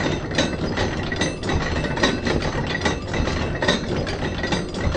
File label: Train Motor Rattle Loop